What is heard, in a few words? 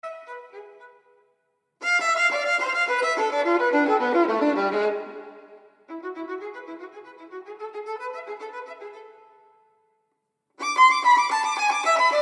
Violin Sonata in A Minor, Prelude; Op. 27 No. 2; I. Obsession